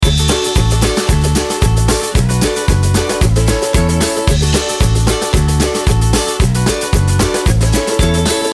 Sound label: Children and Summer Loop